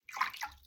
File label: water